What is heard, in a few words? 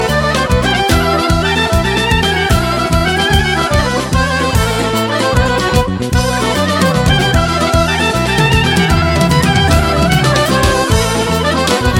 bulgarian folklore